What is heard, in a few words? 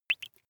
dripping single